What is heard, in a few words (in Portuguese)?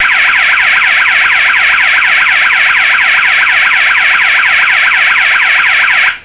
alarme